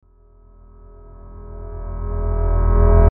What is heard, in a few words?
Crescendo Tonal Abrupt; Rise And Dive; Blastwave